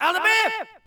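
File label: men yells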